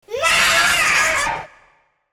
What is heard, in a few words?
Scream No Rather Not; 2496sfx; TheHorror